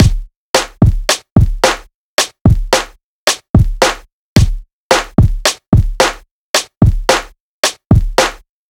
On Beat

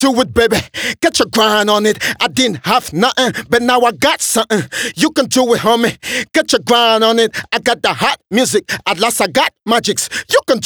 Rap Do It Hook; Hip-Hop

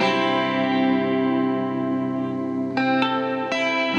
Chords B, гитары rock alternative

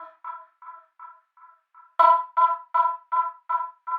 Female Echo, deep house

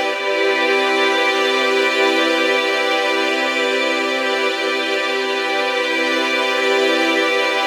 Su Strings; струнные инструменты

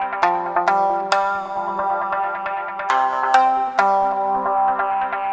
Orienture, guitar

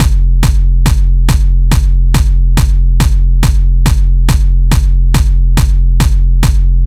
Killzone B, hardstyle

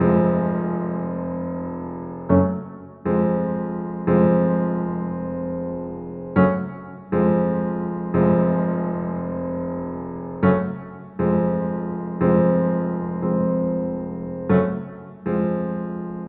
piano, 118 bpm

